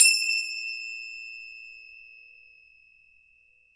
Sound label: FINGER CY L, Synclavier Percussion; ILIO; Synclavier Percussion 2; World & Orchestral